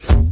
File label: Universal Block Sfx; Superbrawl; Nickelodeon Super Brawl Universe; Android